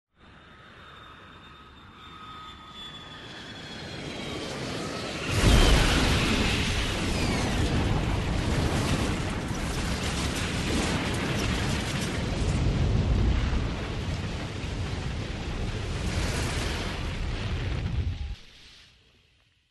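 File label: plane crash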